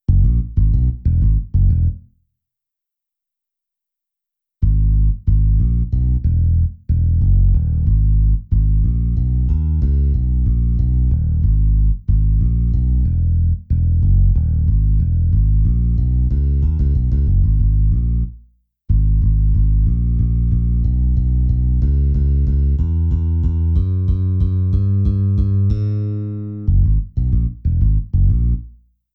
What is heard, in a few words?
maserati bass